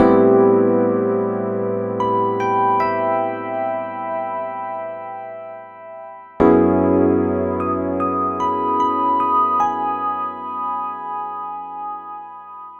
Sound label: Piano clean